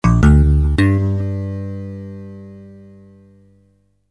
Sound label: ppg bass